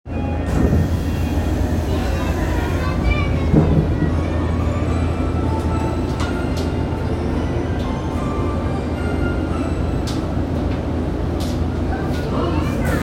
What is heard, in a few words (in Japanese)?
東西線西葛西東, train sounds